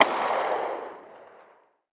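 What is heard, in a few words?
small far distance; Modern Warfare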